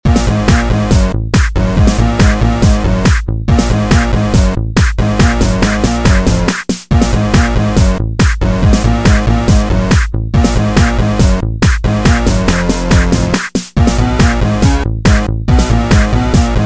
robot rave

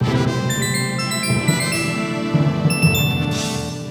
victory; Fanfares

